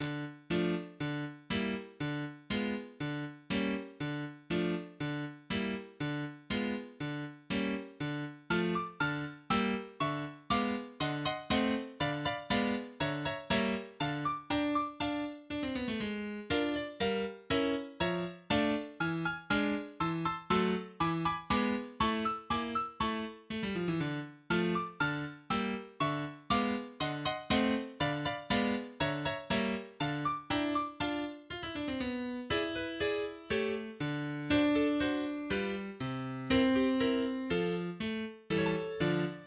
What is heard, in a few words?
Flute